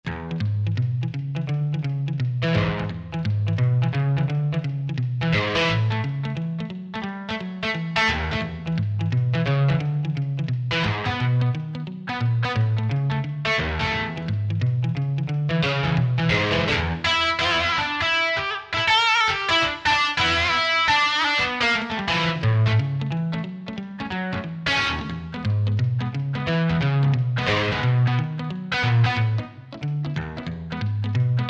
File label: Touch Solo, Roland SRX-03 Studio SRX